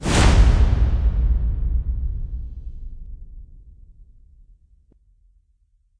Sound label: Combat Transition